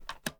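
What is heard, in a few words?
reload fast extended magazine raise; gmod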